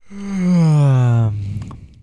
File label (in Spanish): Bostezo